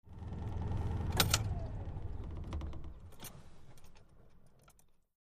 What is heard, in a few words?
Citroen Deux Chevaux, Interior Pov, Idle, Off with Key Noise; Citroen Deux Chevaux 2CV